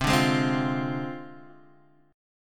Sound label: G sharp 6th Flat 5th C